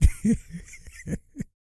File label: LAUGH, Jazz; Spectrasonics Vocal Planet; Jazz FX